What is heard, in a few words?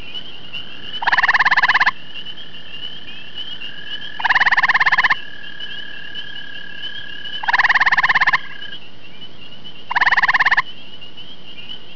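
gray tree frog; Amphibia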